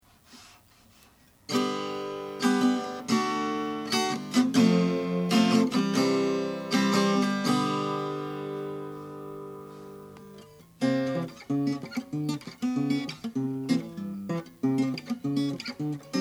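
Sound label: oscar schmidt stella decalcomania, Guitars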